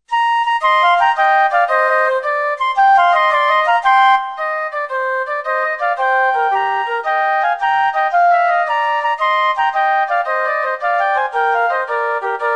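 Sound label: Celtic dance flute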